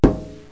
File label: Metal boom